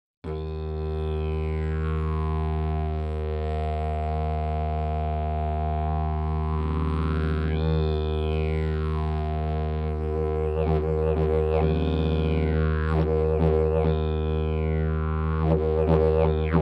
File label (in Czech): orech prirodni, didgeridoo